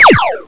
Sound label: laser; yage